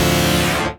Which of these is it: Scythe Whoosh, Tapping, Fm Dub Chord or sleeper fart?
Fm Dub Chord